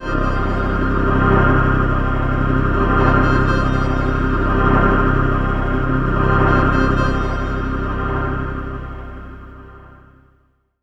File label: Swirl C2; Zero-G - Ghost In The Machine